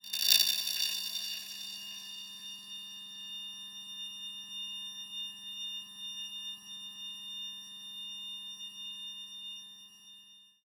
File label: Spirit Sucking Soul